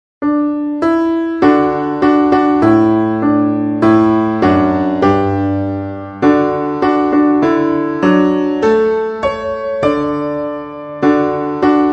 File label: Key of D Melody